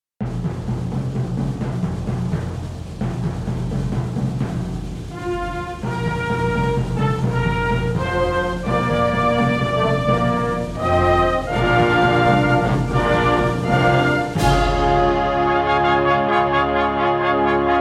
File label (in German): fanfare; andreas-hofer